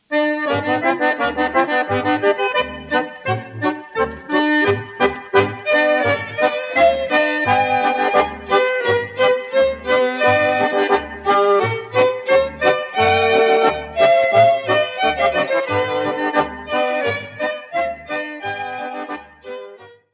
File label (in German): Sauerlander Quadrille, Allemagne